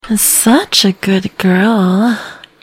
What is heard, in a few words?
music stinger; killstreak music